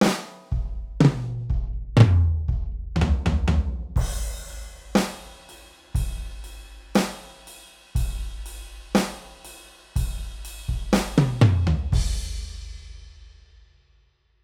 Olden Days Dry

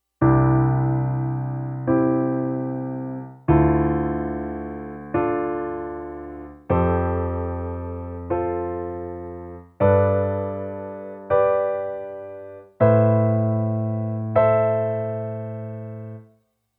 Add 2 to triad

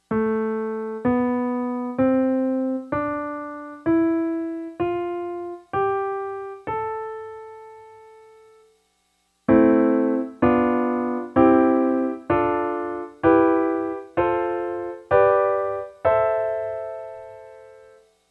Aeolian scale triads

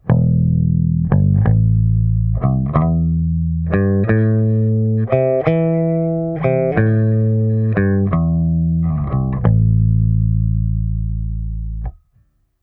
PASSIVE flat

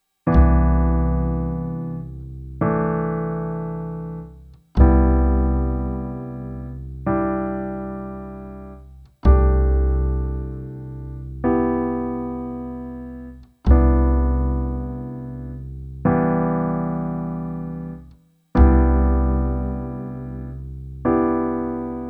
LH rootless major

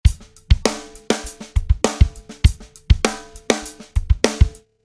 uncompressed drums

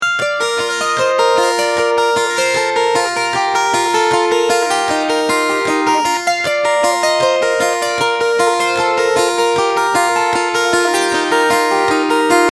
Nashville Tuning; guitar; alternate guitar tunings

